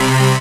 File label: Stab